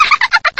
hihikane

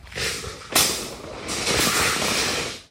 pri stroitelstve tyanut stalnoj prut

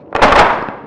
zagruzka starogo vintovochnogo snaryada

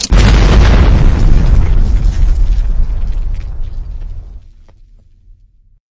c4 vzriv explode

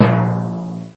litavri odinochnij gluhoj shirokij

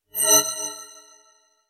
inoplanetnaya posadka

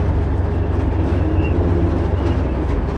tyazhelij monotonnij drebezzhashij shum

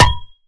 korotkij zvonkij udar bitoj po zheleznomu stolbu

